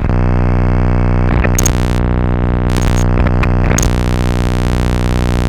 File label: Raw Glitch